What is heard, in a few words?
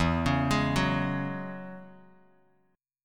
E 6th Add 9th E down Bass Standard